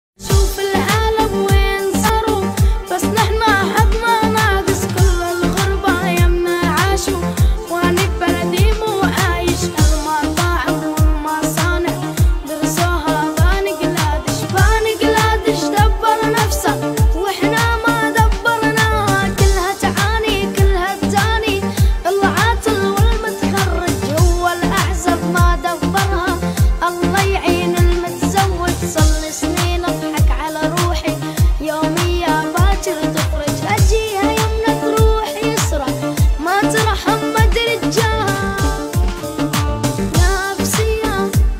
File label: arabic